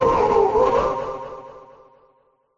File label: cry